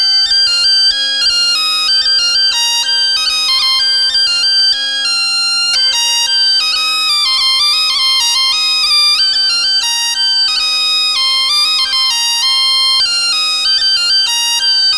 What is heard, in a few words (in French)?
biniou kozh